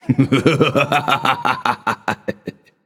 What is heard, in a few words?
laugh; smite; apollo